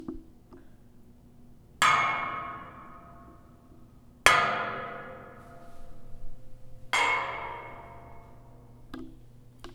metal Knock

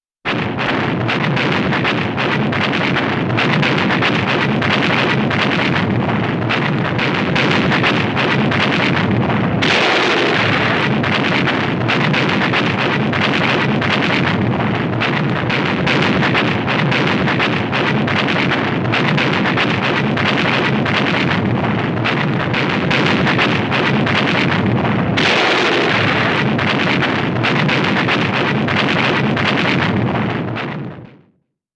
Gun - navy anti-aircraft, 37 mm, continuous

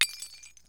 Glassbreak, Chalice Well

